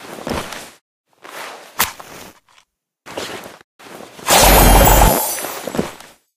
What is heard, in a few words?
gas blast; video games; player; taunt; pyrovision; source engine; tf